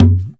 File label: ROLAND SPD-20 PERC TABLA